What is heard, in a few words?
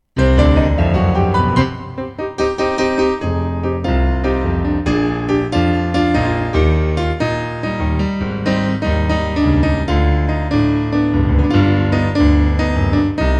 sax